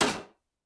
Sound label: Metal Footfall; soundscrate